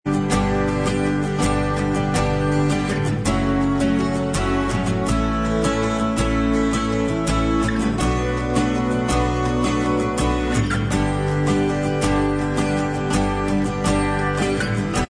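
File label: acoustic instrumental track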